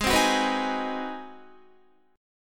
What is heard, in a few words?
Ab 7th Sharp 9th down Guitar; strummed; JGuitar